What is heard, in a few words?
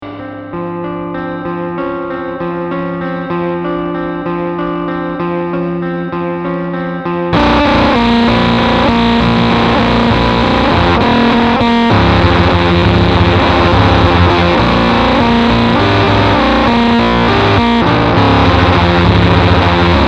slow carnival; apocalypse